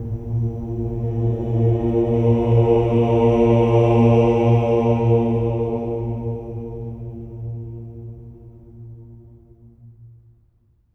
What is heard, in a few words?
OH A#2, SWELL OHS, Voices; Spectrasonics; Symphony of Voices